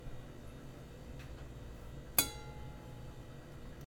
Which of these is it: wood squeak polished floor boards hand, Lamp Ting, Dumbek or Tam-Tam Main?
Lamp Ting